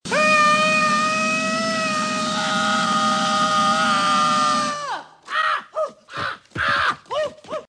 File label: Blowtorch